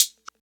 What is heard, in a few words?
hat, drum sample; Hats